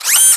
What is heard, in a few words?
goggles down